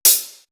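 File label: Hi hat, Drum One Shots; AMG - Coldcut's Kleptomania VOL-1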